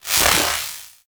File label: Power Rift Arm Movement; Gameplay; Warframe; Zariman